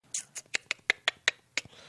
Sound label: tongue clicking sound